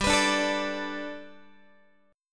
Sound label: G Major Add 9th Guitar, chord; Gadd9 strummed; JGuitar